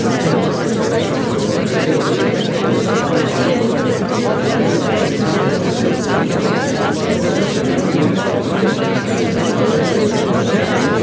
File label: babble noise